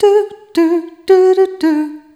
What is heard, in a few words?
Rhythmic G